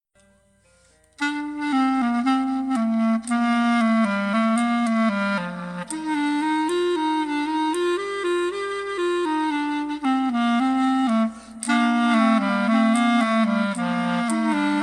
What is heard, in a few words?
Clarinet Canon